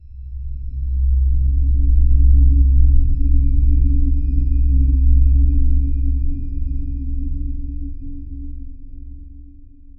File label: dark ambient drone cinema; no melody